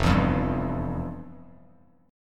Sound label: F Major 7th Suspended 2nd, Bass Standard; strummed; FM7sus2; 1,7,3,0; JGuitar